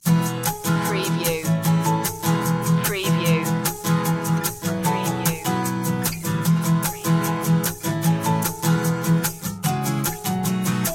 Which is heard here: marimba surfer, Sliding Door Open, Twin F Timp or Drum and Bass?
marimba surfer